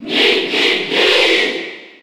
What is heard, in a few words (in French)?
Cheer French NTSC; Mii; SSB4